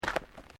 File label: Footsteps Boots Gravel Fine Step; Footstep Foley; Human